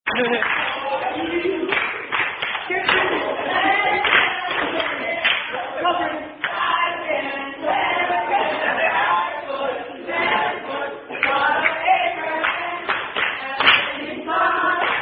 Father Abraham, Unusual Clapping Instead Of Gestures